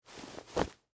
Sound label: Winter Coat Adjust, Clothes, Movement; Foley; Straighten, Arm; Dressing; BlastWave